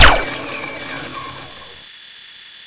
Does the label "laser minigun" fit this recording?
Yes